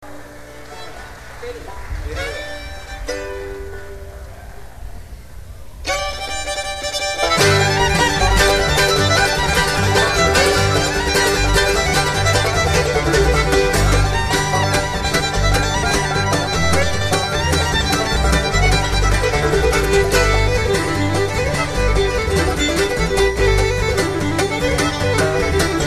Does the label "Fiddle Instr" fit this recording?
Yes